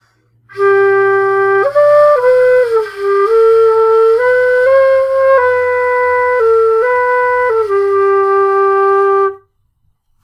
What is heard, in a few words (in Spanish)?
flauta g